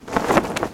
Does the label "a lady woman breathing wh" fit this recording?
No